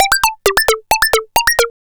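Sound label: synth sequence; X-Static Goldmine 1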